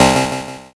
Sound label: zap